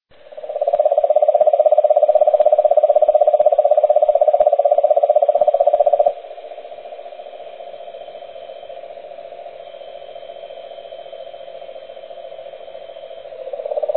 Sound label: cane toads call